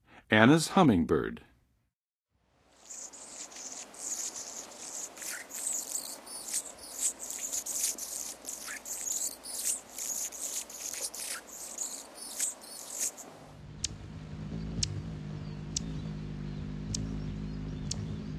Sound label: Annas Hummingbird, Songs of Birds; North America; Western USA; Western USA Songbirds